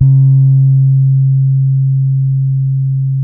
D 4, old soul, bass; Spectrasonics; Bass Legends

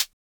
closed HH, Univox SR-95

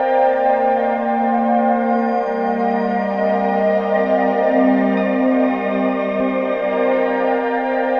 Soaring, Guitartronics; Sonic Foundry (Sony Creative Software); Ma Ja Le Ethereal Textures

